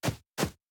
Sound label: Birds Footsteps Loop; Josh Journey Darkness Totems